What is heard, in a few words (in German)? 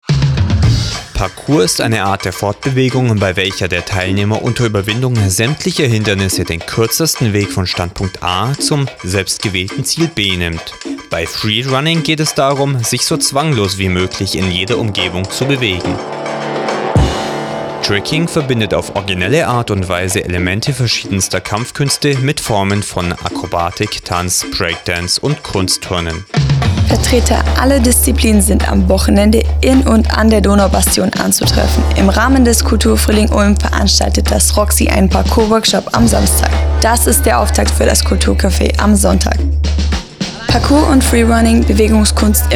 jingle; kulturcafe parkour